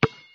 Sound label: basketball floor; Looney Tunes - Recess